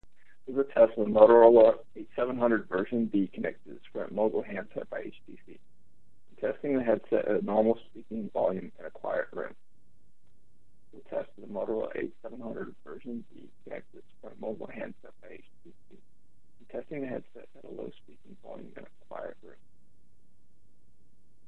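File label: Quiet room, headset; Moto H700B Mogul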